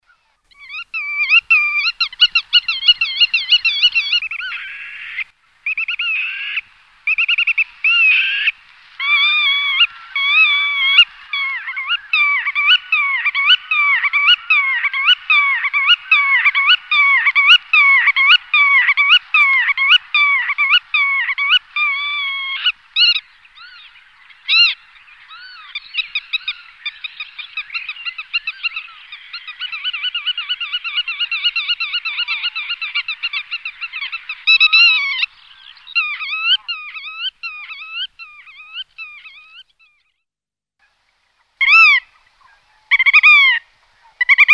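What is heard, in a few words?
black-tailed godwit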